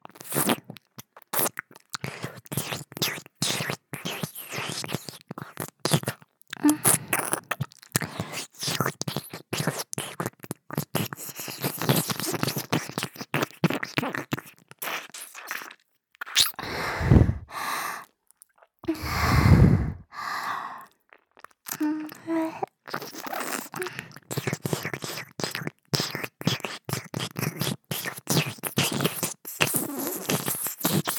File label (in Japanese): チロチロチロ…, 右耳